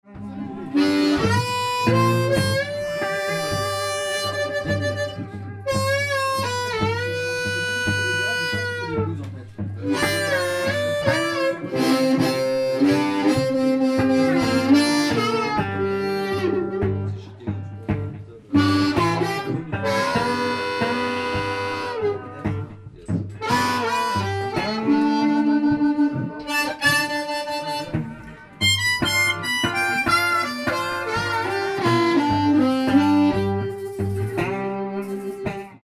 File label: Fireball V Slow Blues